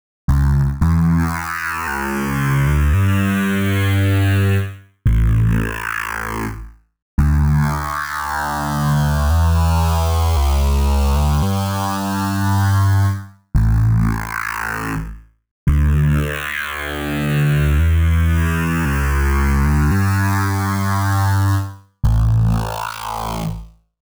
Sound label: weird bass DRY, 113BPM